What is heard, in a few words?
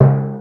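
RX PERCUSSION; Kid Nepro; Elite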